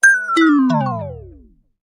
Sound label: chimes power down